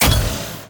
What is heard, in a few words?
Attack